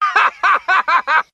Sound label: laugh